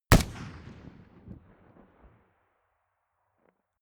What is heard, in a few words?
rifle ak-47 exterior fire single distant; comedy cartoon; pistolen gewehre; Pistols and guns